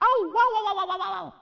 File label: burnup; superbroswar